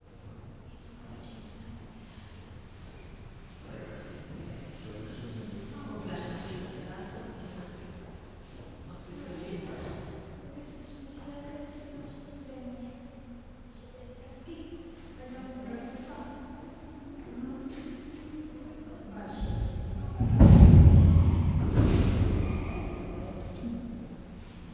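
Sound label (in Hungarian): dongók látogatók, majomház; veszpremi zoo 2013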